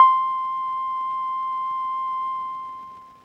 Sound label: Jupiter-8 PLUCK Twinkle - C; Synth; One Shots; Cymatics; Fantasy Synth Sample Pack